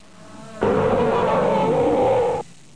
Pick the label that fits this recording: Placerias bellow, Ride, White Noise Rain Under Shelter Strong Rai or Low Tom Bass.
Placerias bellow